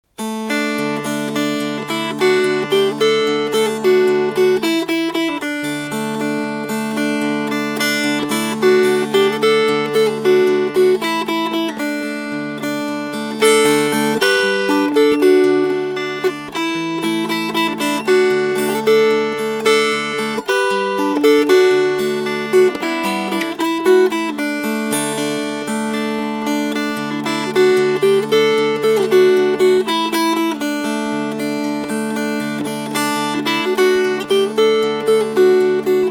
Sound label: One More River Finger-Picked